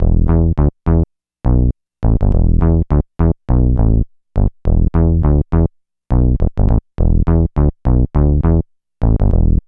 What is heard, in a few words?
BASS LP